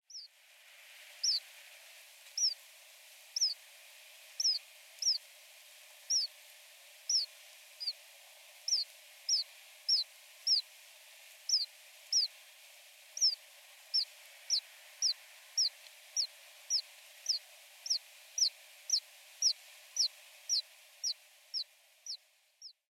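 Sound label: Alpine Accentor; iwahibari; norikura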